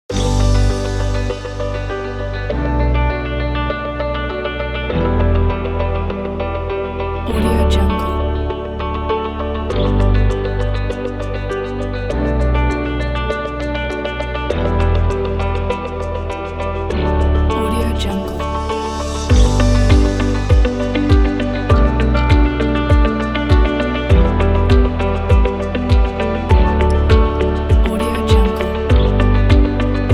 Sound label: soft and calm background ambience